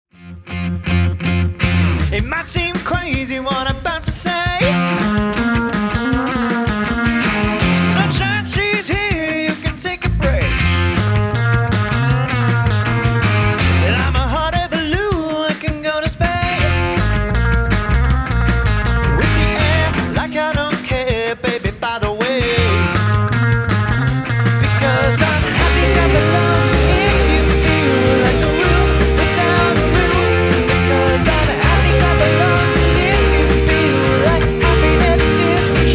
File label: Rock Pop Party Band; Somerset